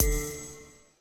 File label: Gun Finish; Game rip; MiSide